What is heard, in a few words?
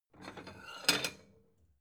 plate small take slide soft